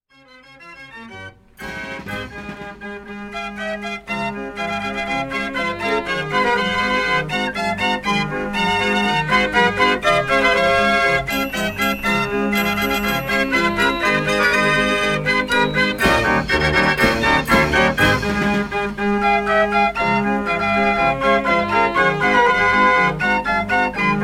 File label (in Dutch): draaiorgel